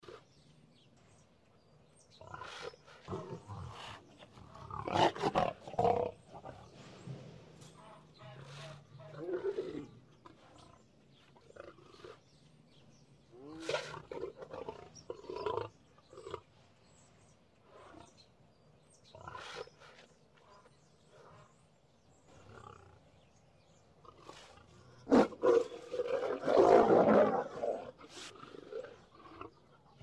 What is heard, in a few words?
atmo animal lions